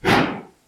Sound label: Single Steam Train Chuff